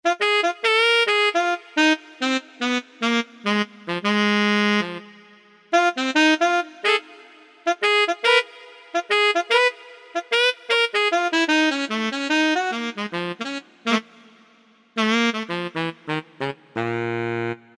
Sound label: SAX; Best Service Alpha Dance II